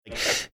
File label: death rattle